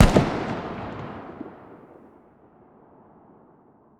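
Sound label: scar20 distant, gun; arccw go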